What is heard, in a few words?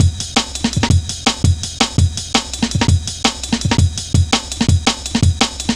jazz ridez, drum loops; Zero-G Jungle Warfare 1, 2 & 3